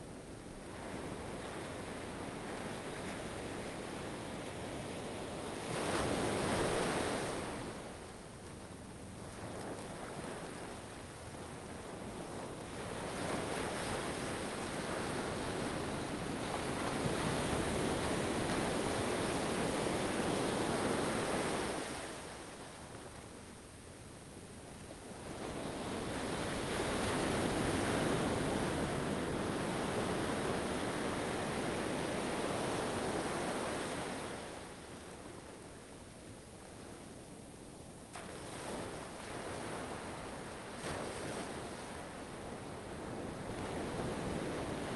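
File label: exterior day beach steady ocean waves in the wash close no people; ambix; sonniss gdc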